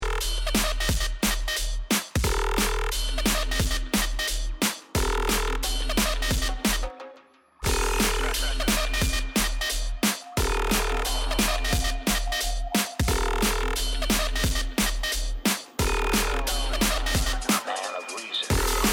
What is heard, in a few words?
BASS WEEDY DRUM